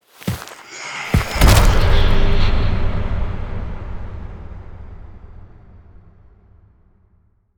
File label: Fall; Umbra; Warframe; Sacrifice; Sacrifice Shrine Intro; Cinematics